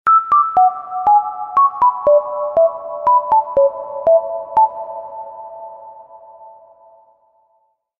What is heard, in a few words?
Nokia Beep; Ringtones